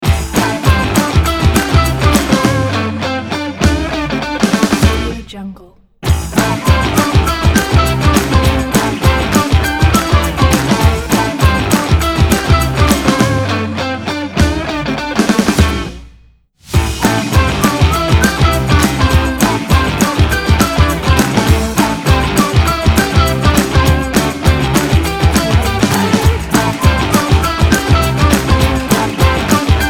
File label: upbeat opener intro